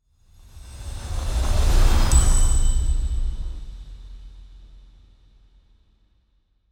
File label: CHRISTMAS IMPACT